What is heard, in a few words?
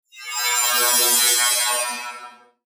Electrical Digital Warp; Blastwave